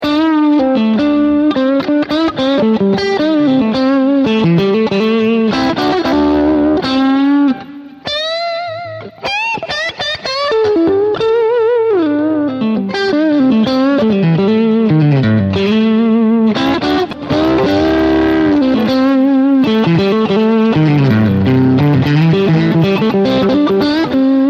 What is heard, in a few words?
Booster, pedals